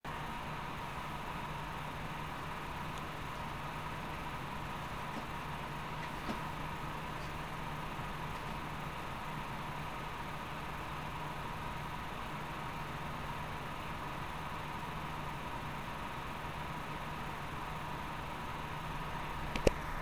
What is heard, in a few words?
HD 6850 Reference loaded; XFX